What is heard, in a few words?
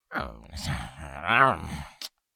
Grunting little fantasy creature; fantasy friends